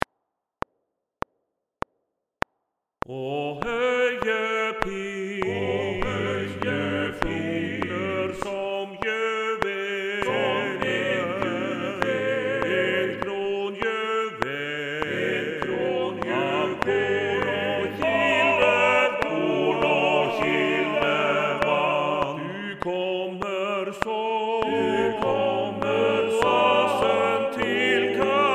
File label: TUTTI